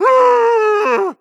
idle moan dry, zombie vox; creatures

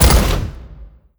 step approach, dog; npc; scripted

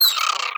vox hungry growl, creatures, snark

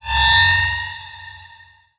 shoot warning layer, strider, combat; creatures

